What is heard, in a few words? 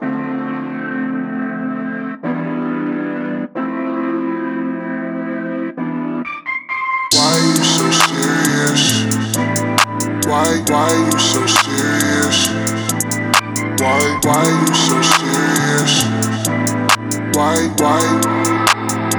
Pressure; Native Instruments MELTED VIBES; 旋律打击乐调配合成器